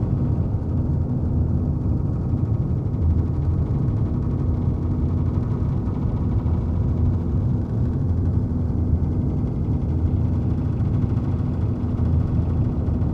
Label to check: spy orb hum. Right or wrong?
Right